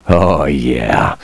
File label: LAUGH; BattleSNDS